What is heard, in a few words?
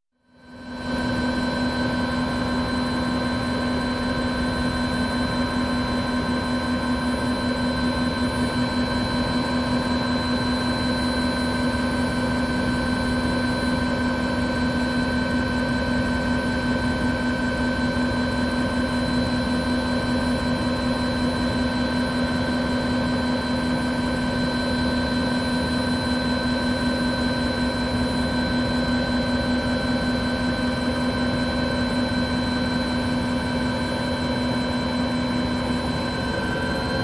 Helicopter taking off from cockpit